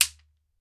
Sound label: SHEKERE F3, African Perc; World Combos; E-MU Producer Series Vol. 7 – Old World Instruments